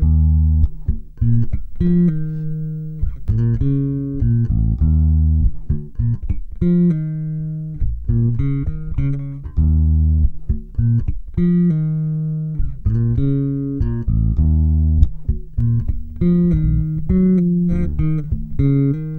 BRAZIL 100, Bass Grooves; USB Soundscan vol.30; AKAI

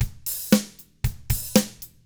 116 ROCK, Double Platinum Drums; ILIO

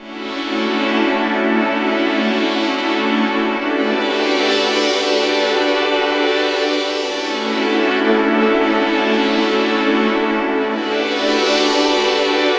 Synth Chords; Eclectic Electronica

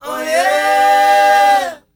O YEAH, S. AFRICAN, World Voices; ILIO; Vocal Planet

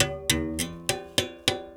Tar, World Percussion

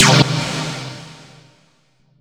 Stab C2, Club Techno; Zero-G; Stabs

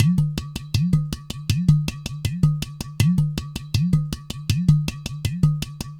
Udu; Sonic Foundry (Sony Creative Software); Joe Vitale Latin Percussion

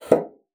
BASSOON; Xsample VOL-6

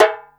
tabla big tak, Yamaha Motif ES drum machine; 300 Drum Machines; Yamaha Motif ES-Series

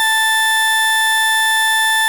FARFISA ORGAN, Vintage Timetraveler; Sonic Reality

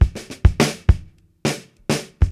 Beat Loops; Zero-G; Monster Beats

